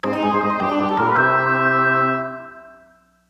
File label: Level Clear; Luigi's Mansion Dark Moon